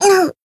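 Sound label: damaged, Japanese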